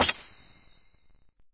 Hero Skill Confirm

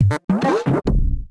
Fruitpop

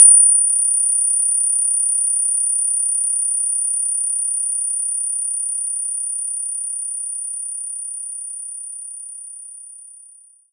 DX7 Strike

